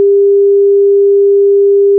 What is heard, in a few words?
sound sine